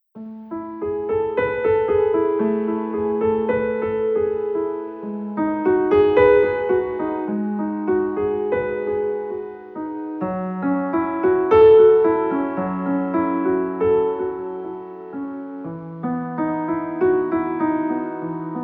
Piano Version; Hello Dear Ancestors; China

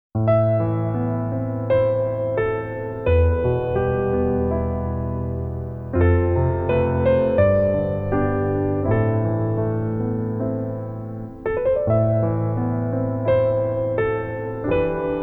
Piano; The Untamed; China

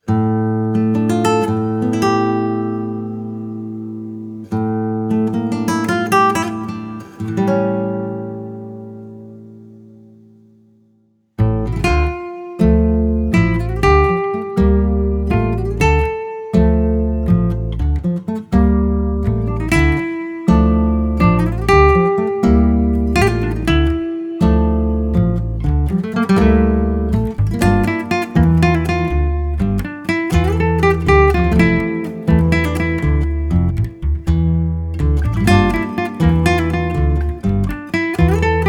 Guitar ver.; High Class; Korea

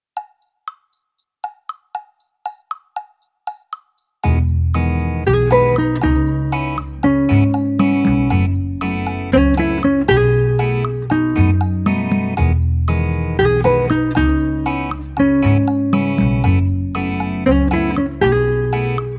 Piano Theme Bossa; The Package; Korea

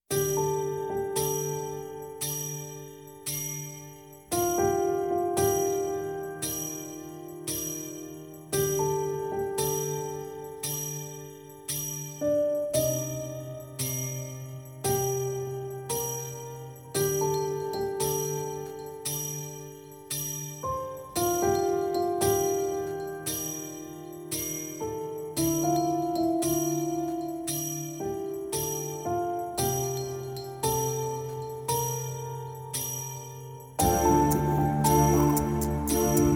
Piano Ver.; Gu Family Book; Korea

